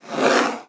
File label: Hard Slide Short